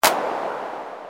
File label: drm1 Claps